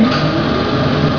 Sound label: machine